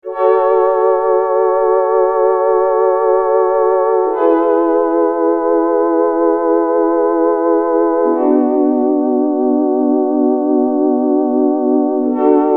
Chorus DX7 ohne FX

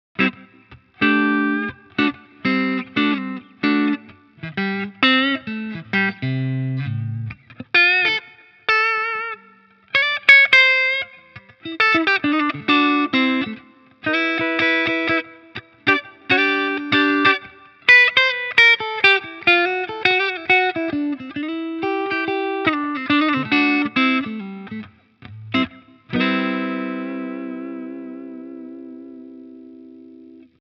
FGN J Standard Odyssey Classic clean Halspickup

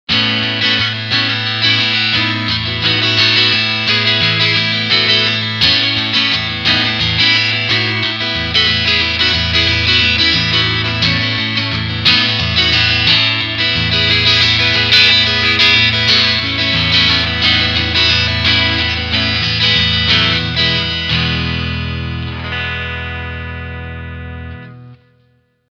Clean Strumming beide PUs

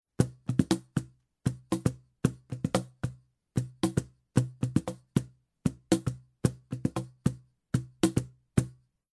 Cajon Groove Front Pitch Control zu Pur Cajon unbearbeitet; Beyerdynamic MC 930

